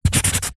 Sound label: office marker pen scribble short on cardboard sheet